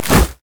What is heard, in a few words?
basicattack; maokai